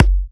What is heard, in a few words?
F#, Kicks; markus hakala